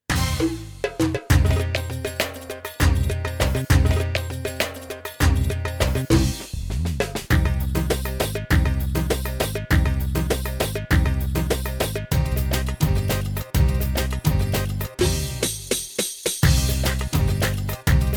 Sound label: Iraqi mix